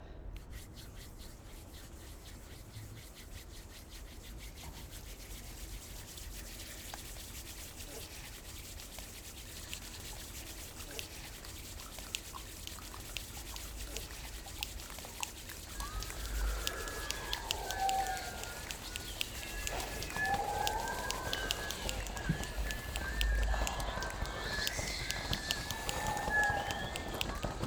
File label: Grade 1 Thunderstorm Soundscape